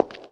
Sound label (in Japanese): ashioto